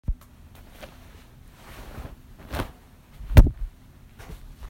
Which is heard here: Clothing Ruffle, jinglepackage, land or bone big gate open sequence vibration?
Clothing Ruffle